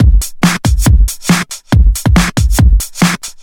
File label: Kick Loop, 140bpm